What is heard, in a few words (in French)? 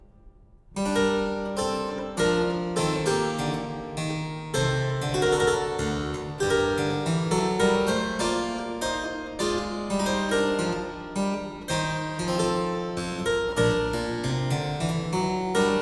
menuet; Wilhelm Friedemann Bach